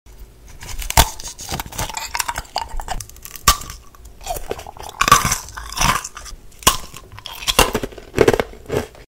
Chewing ice

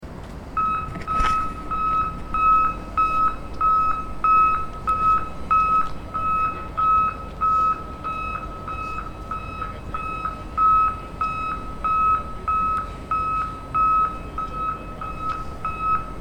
tractor reversing in the park

